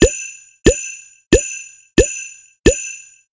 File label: Snow Ball Idle; Seer; GamigoNA